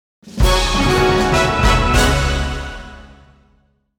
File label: Double Up Win